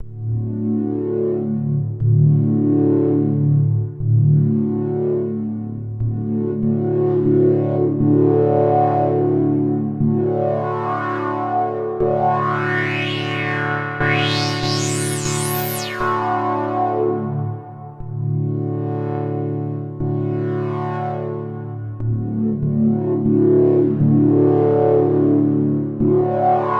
shy threeler chord

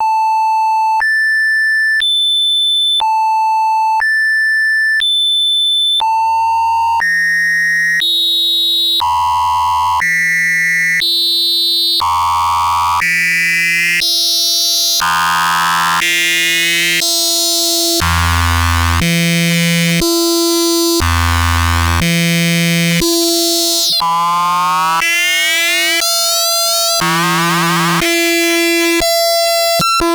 soft sync

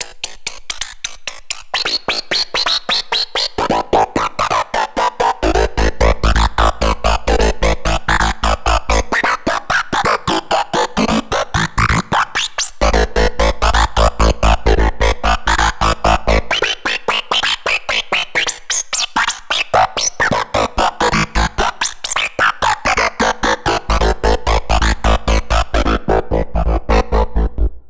shy threeler technosync

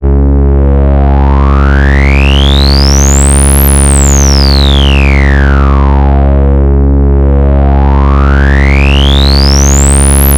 distortion test undistorted

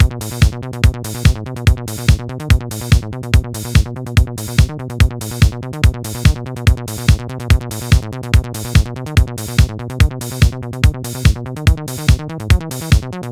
motm 440 144bpm

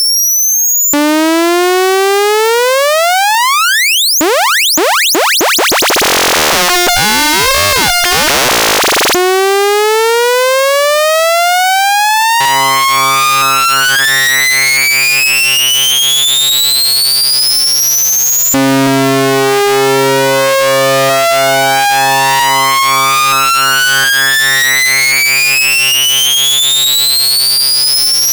hard sync ramp mod, synth

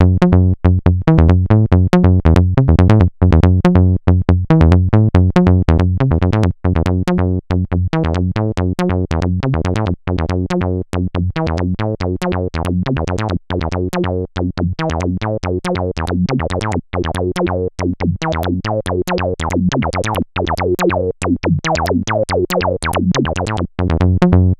bassline SSM2044

